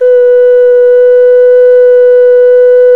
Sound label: OCARINA B1, Exotic Winds